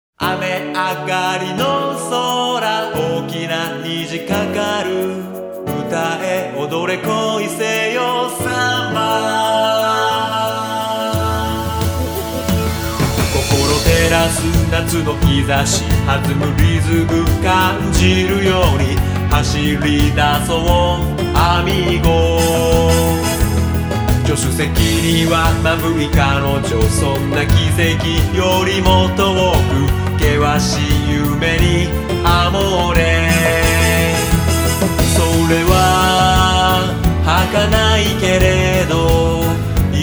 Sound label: viva samba